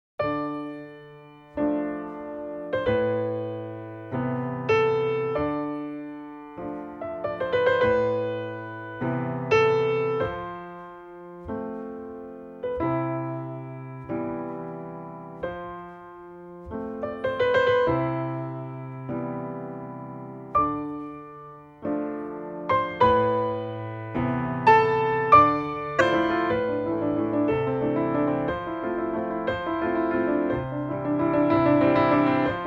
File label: Piano Version